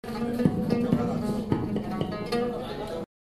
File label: loop